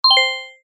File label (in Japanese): 場面切り替え ベル